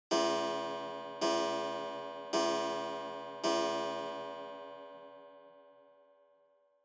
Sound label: Pendulum Clock Chime Long